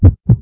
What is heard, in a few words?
heart beat